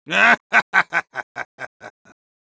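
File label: laugh evil, Spanish; Medic; Risa malvada 2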